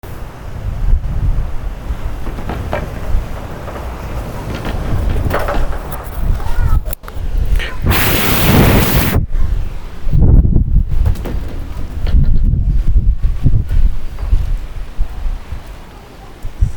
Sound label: heavy wind storm